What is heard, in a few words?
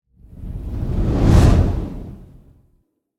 Whoosh Fireball, Burst, Transition; Production Element, Imaging Element, Accent; BlastWave